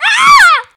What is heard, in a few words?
death; player